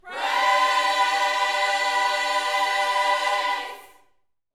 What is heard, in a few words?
Vocal, Gospel, PHRASE; Spectrasonics